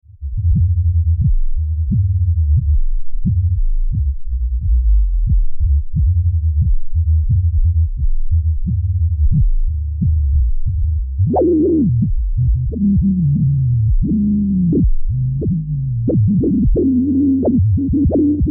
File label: Basso